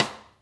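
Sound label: side stick, Interactive Drum Kits; Sonic Reality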